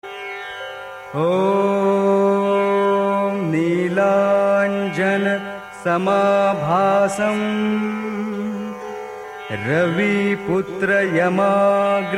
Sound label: Shani Mantra